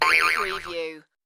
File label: Boing